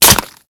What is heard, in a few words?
Melee Impact Flesh; Warframe; Kubrow